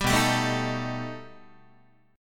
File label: B Augmented 7th B down Guitar; strummed; JGuitar